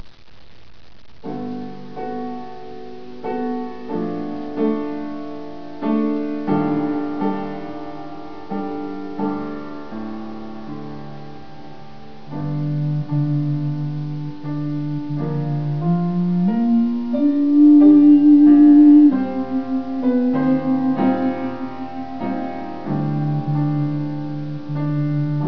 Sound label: rain 8bit